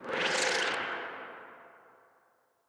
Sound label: ship engines; World Of Warships